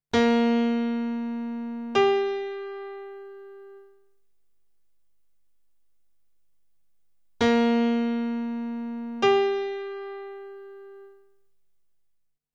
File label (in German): Intervalle Sexte